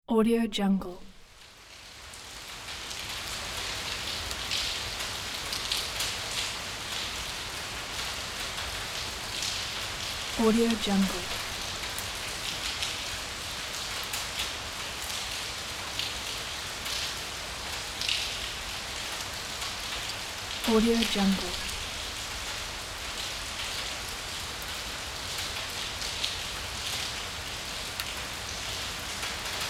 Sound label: rain on street